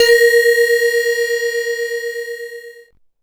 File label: BELL-GONG, A#; Big Fish Audio